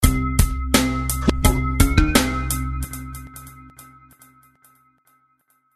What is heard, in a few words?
Terror Sms